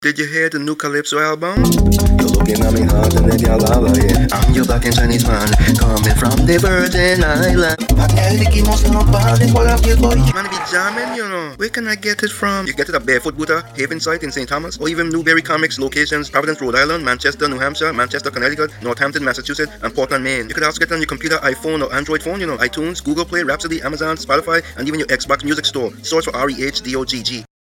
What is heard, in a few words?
Calypso Music